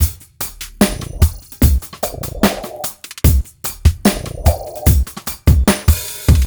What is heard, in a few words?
HipHop Combo C maj; Digidesign; Pro Tools Essential Loops